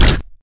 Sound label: w Worm Hit; Risk of Rain